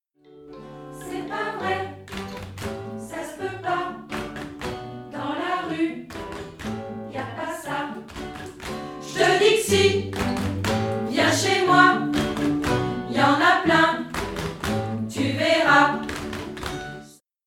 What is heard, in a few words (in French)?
refrain rythme